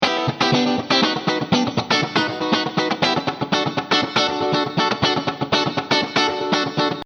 Funk Guitar Sm Hall

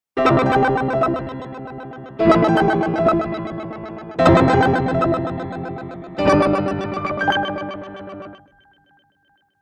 Korg SDD-3000 Piano Rapid Fire Synth; UAD

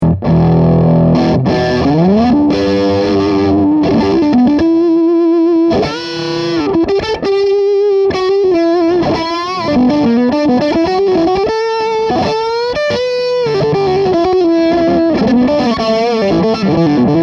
Mo Gain Humbucker

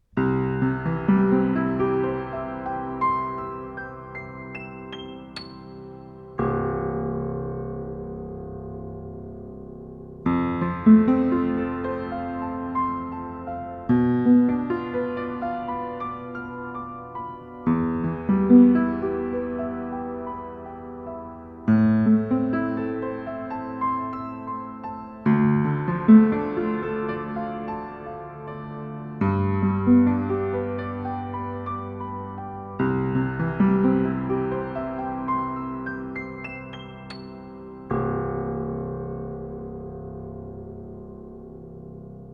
AKG C417PP Piano Mic Outside Wall

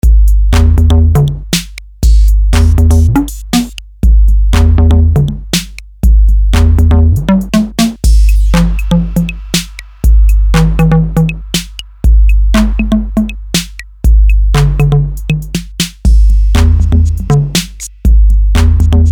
MPC-500

